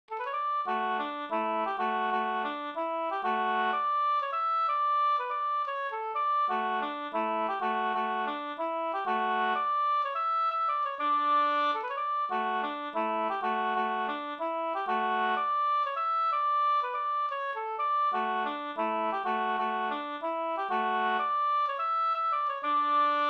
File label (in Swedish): hamburska; Urburskan Sd